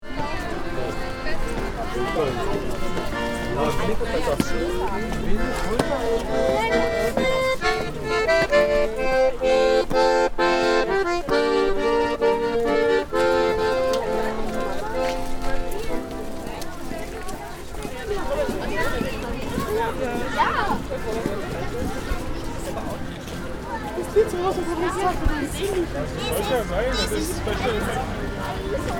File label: City square ambience crowd street artist